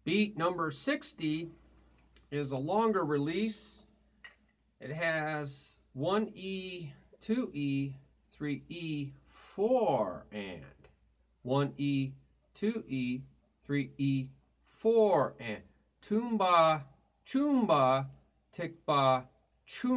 Beat 1e 2e 3e 4 Toom Ba Choom Ba Ti Ba Choom Ba

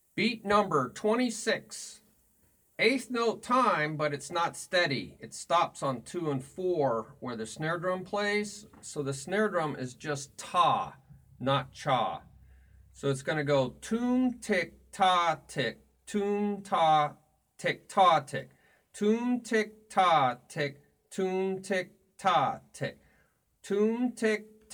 Beat 1 2 3 4 Toom Ti Ta Ti Toom Ti Ta Ti

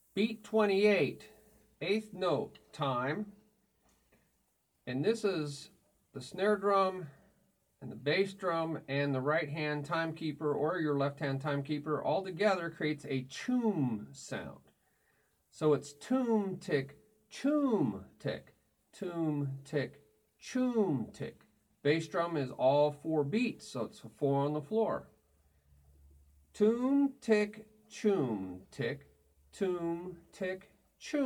Beat Toom Ti Choom Ti Toom Ti Choom Ti

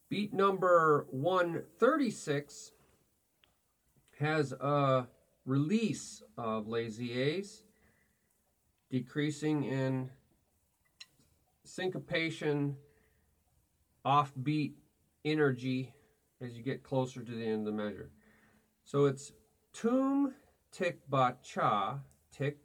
Beat 1 a2 a3 a4 Toom TiBaCha TiBaTi TiBaCha Ti

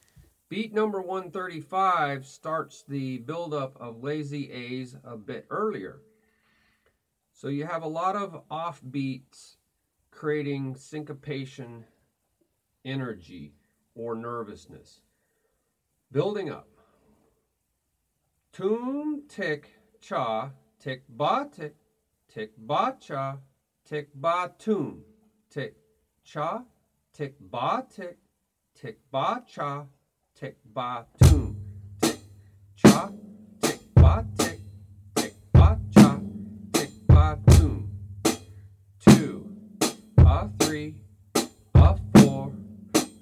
Beat 1 2 a3 a4 a Toom Ti Cha TiBaTi TiBaCha TiBa

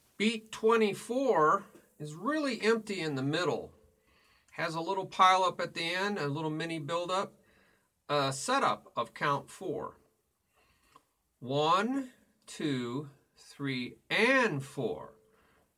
Beat Toom Cha Ti Ba Cha

